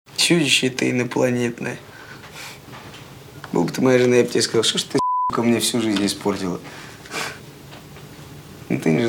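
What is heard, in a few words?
alien monster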